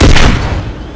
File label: sniper; cstrike